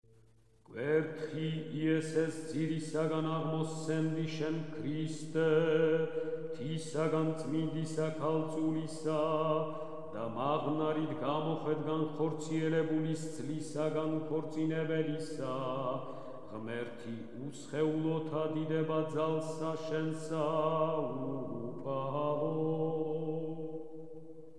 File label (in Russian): irmos iz kanona; Rozhdestvu Hristovu